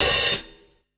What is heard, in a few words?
Hat, breakcore Drum Hits